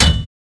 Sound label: clank; midnight guns